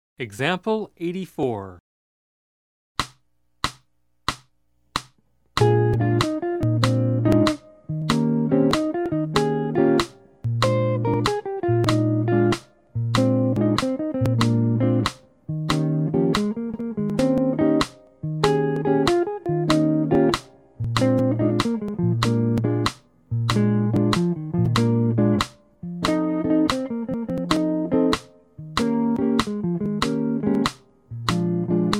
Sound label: Jazz; jue shi ji ta